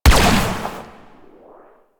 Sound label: Amp Barrel Fire, Amplifiers; Weapons; Barrels; Warframe; Operators